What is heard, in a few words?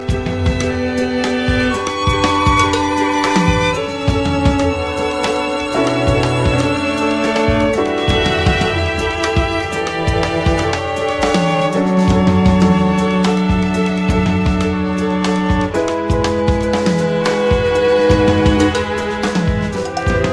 COMBO PIANO